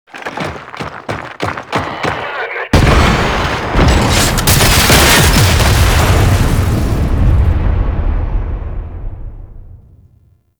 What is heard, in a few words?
Rocket Strike Stinger; Warframe; NineteenNinetyNine; Stage12PartyOfALifetime